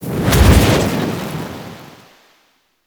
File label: Grineer Generic Teleport; Warframe